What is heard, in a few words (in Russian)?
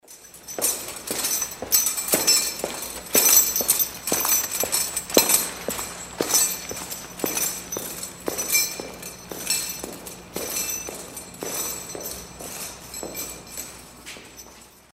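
звук шагов охраны тюрьмы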